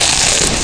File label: chips